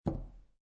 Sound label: Poker Chips One Chip Dropping On Felt; Gambling Sound Effects; Casinos